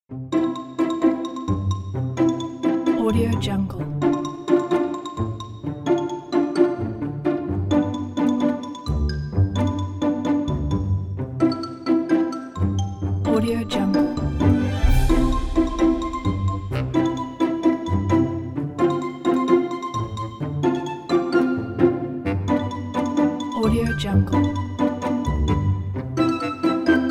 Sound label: kids fun play